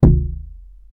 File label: the sound of a hollow, props